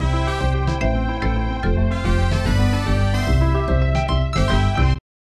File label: Stage Completed; Yogi Bear; SNES